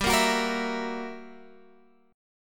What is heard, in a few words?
G Minor Major 13th, G, Guitar, Open G